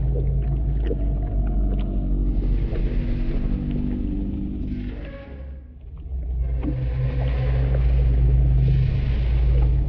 void worms mature idle; Stellaris; grand archive; ships

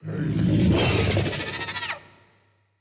cutholoids emerge; Stellaris; grand archive; ships

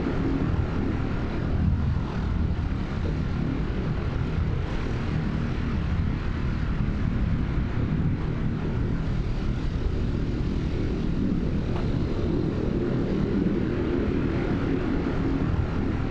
ship juggernaut idle; Stellaris; machineage; ships

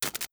wraith spark; Stellaris; guardians

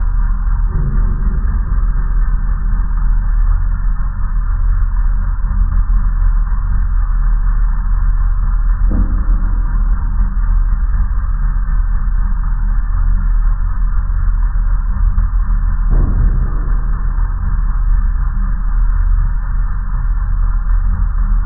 interstellar assembly beats; Stellaris; megacorp

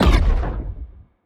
laser hit, weapons; fire rate; Stellaris; biogenesis

